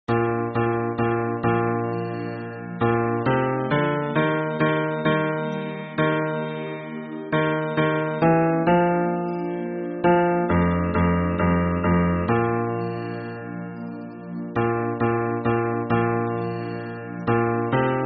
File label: hymn bass